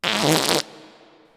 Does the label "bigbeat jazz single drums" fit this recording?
No